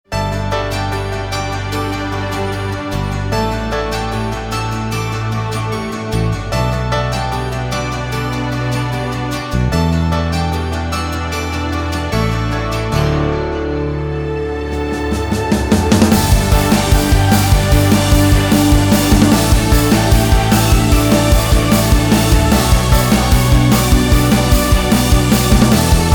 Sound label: Corporate Timeline; Motion Array